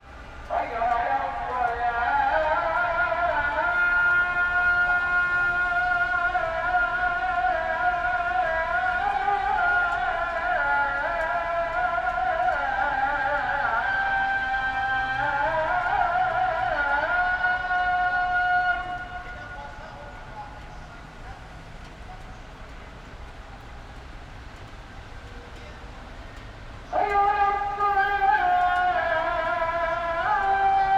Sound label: Istanbul, Calls to prayers, Morning, Taksim Square, City traffic, Car hor; Car horns; Sound Ex Machina